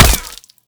bullet hit, bullet collide; STALKER; IWP

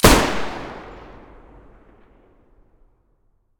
muzzle raging stereo, taurus

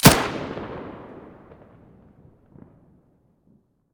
muzzle stereo

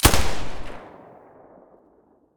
silencer galil stereo, weapons

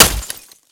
glass, bullet collide; STALKER; IWP

